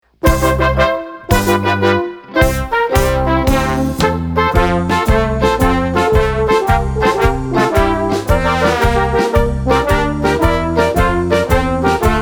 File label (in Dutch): instrumentaal